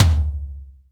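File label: tom, real mega drums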